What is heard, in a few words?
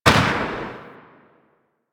Void Dragon Orb Charge Explosion; Enemies; Abilities; Warframe; Duviri